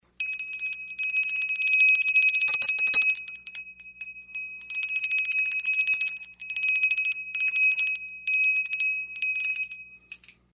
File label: Jingle; July